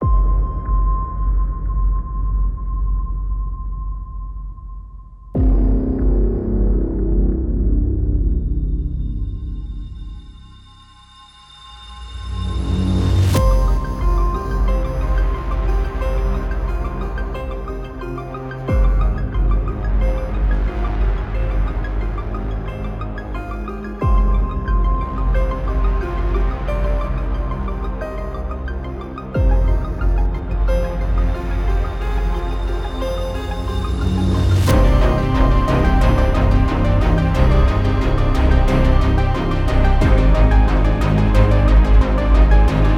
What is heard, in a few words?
orchestral scifi cinematic sound; beyond human